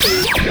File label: retch servo attack; Quake 4 Alpha; id software; strogg